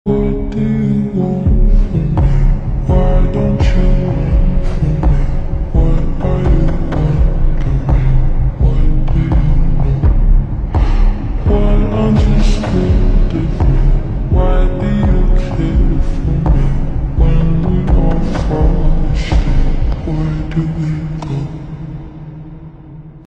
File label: lofi remix ringtone